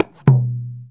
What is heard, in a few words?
INDIAN DR A#4, INDIAN PERC; Spectrasonics - Heart of Asia CD2